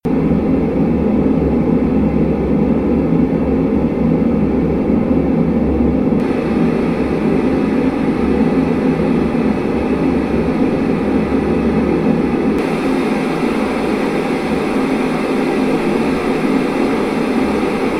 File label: Lectrofan Classic white noise